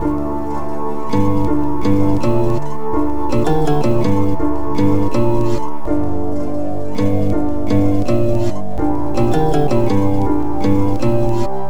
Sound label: Melody, Hip-Hop; Sonic Foundry (Sony Creative Software); Lukecage Underground Soundlab Hip-Hop